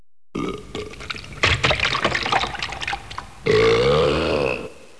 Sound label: vomit